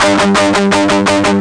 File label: fast riff, guitar; OctaMED SoundStudio V1